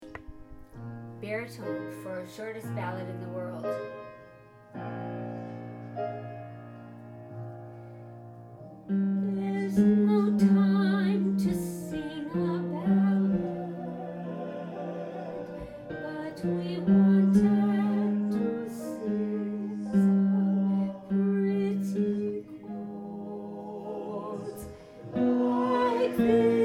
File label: shortest ballad bar